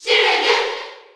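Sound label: Cheer Japanese; Shulk; SSB4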